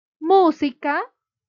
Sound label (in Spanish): musica